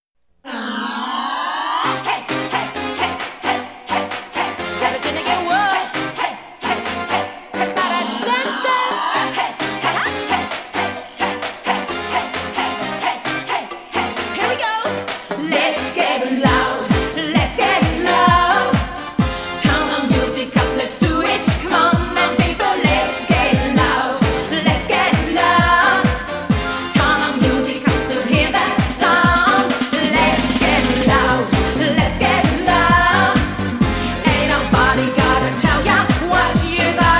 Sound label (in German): Gesang